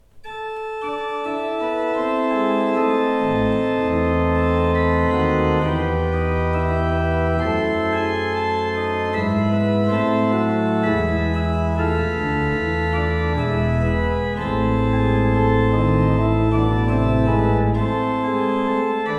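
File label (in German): Prengel 14 II P, pfeifenorgeln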